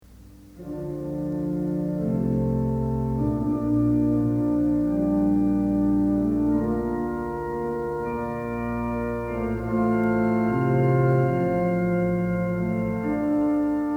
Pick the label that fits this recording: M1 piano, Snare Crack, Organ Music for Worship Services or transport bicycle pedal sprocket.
Organ Music for Worship Services